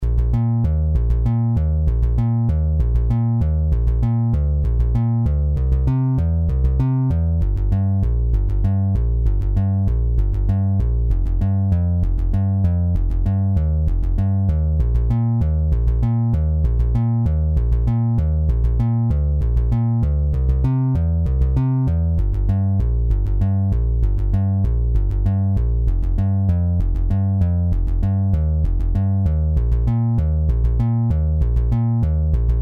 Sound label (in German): Bass; zombiefarm